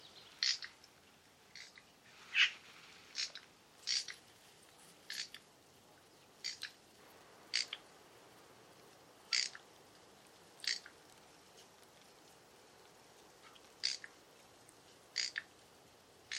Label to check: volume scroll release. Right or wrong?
Wrong